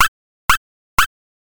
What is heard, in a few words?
retro coin, ui sounds; mikroconsult